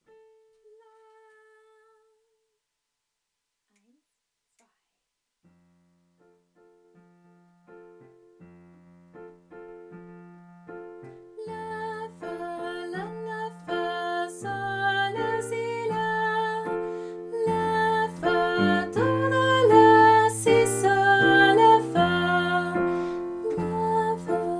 Lektüre mit Melodie

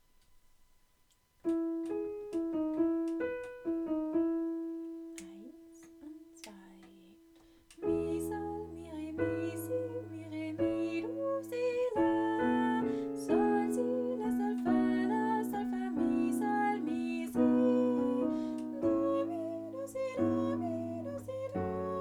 mit Gesang; De Smet III 5:1

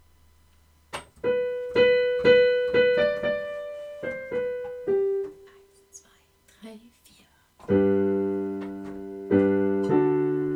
Gloria in Excelsis Deo Begleitung

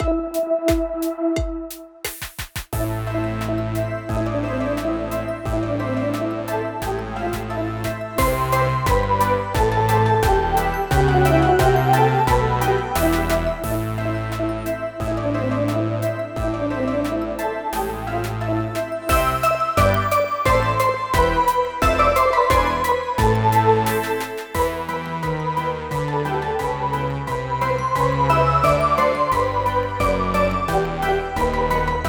Begleitung

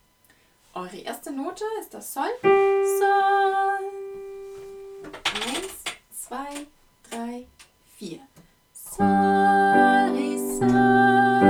mit Melodie

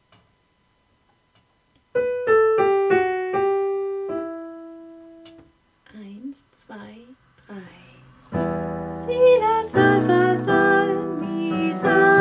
mit Melodie

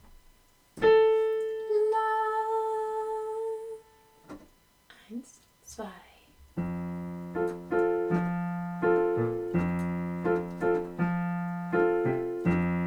Lektüre ohne Melodie

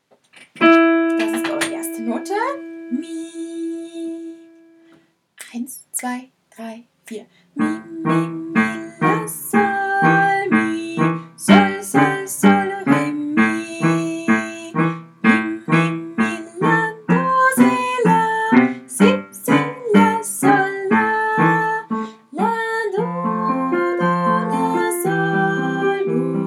mit Melodie

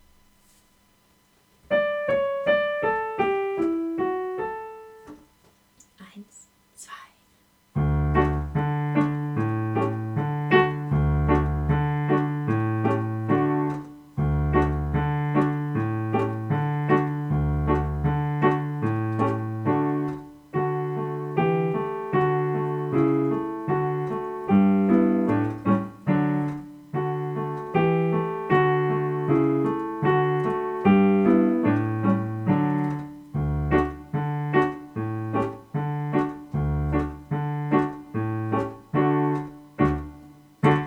The ashwell carol Begleitung

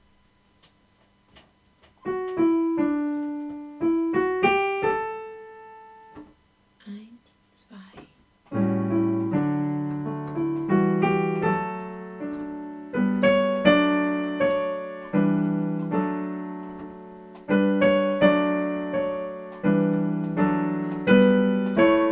The first Nowell Begleitung

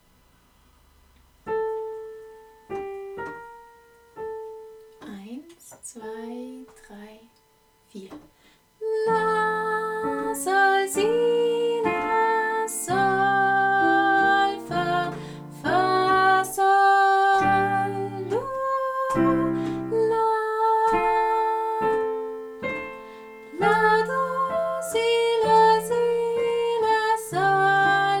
mit Melodie; MB Lektüre

